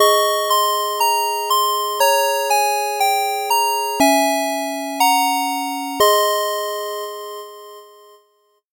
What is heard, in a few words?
8bit jingle slow